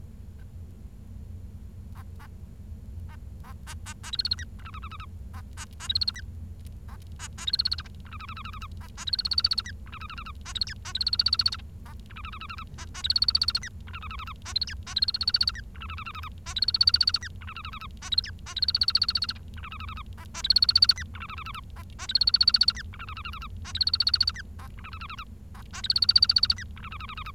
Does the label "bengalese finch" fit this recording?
Yes